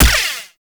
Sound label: Rifle Lancer Gun Shot; Warframe; Narmer; Weapons